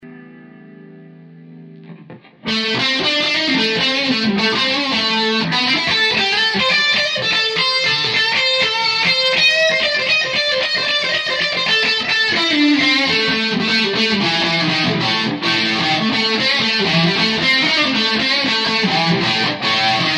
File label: dist, humbucker